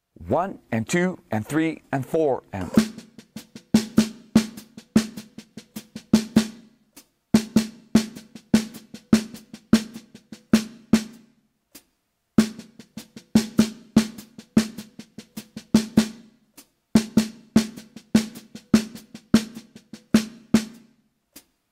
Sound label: Melody Triplets